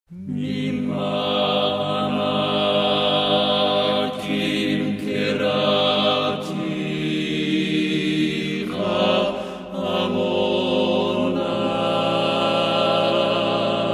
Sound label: ensemble vocal; naumbourg; mimaamakim